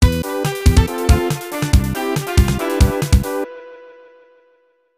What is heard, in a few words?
Lose; super zugan; snes